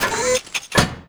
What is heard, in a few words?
turret aa startup